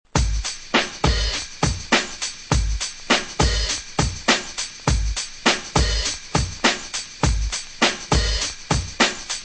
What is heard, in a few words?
drum loops